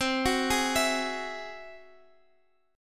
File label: G sharp Augmented 7th C